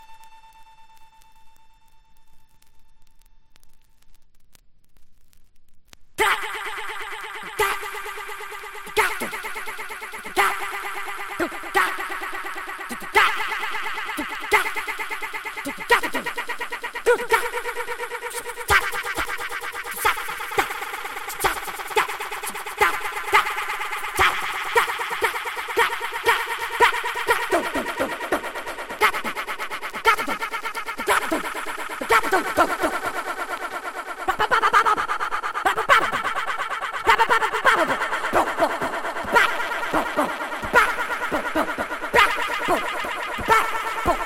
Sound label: 6_8 Drumz 45rpm